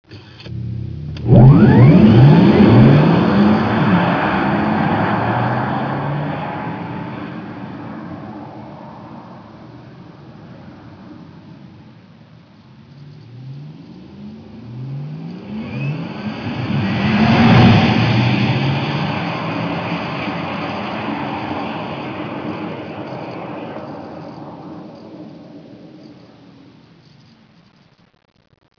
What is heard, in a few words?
porsche taycan